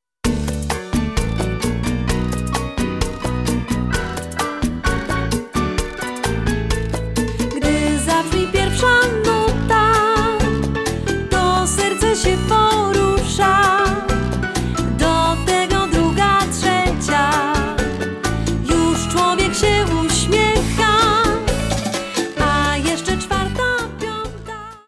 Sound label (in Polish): rytm i melodia